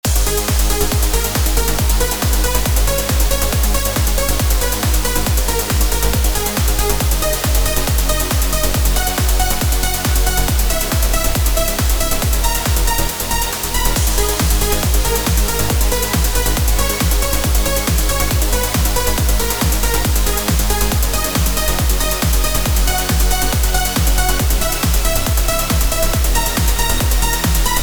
Kit trance

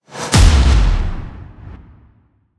Sfx Trailer Whoosh Hit; EMP

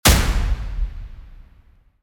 Trailer percussion

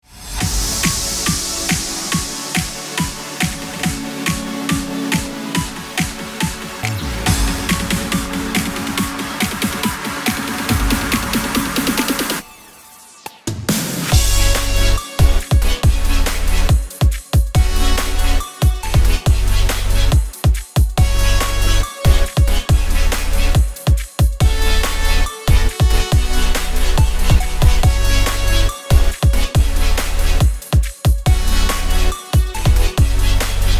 Future bass

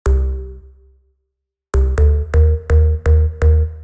Vital Pluck Techno House

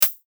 Hi Hats Deep House; deep house bundle

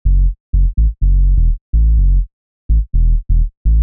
Bass midi Techno House

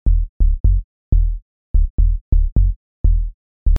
Bass Loops Deep House

